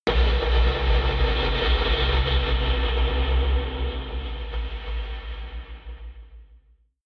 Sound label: noise impact rumble; impacts; hits-explosionen